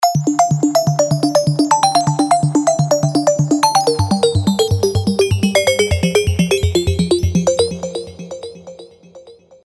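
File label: arp, Sylenth1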